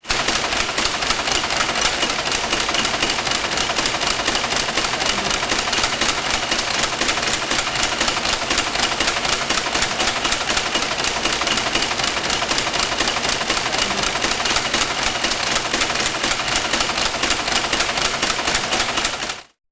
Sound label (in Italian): monotype fonditrice veloce; monotype supra